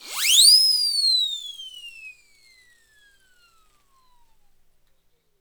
WHSLSIRE, Synclavier Percussion World & Orchestral; ILIO; Synclavier Percussion 2